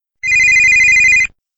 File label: Motorola, mobile ring